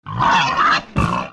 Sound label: Wildboar Die; Eft